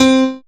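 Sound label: piano C, House Selection, Dance Synths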